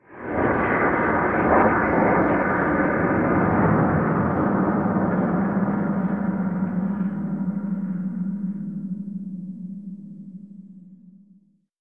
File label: VFX Atmos